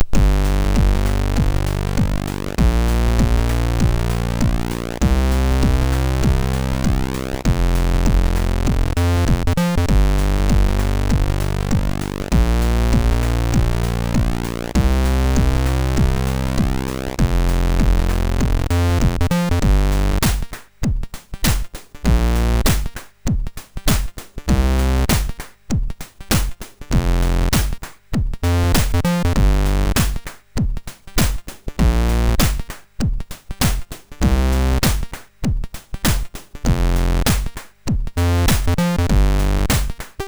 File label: Enjoy the Funk, MOS6581R4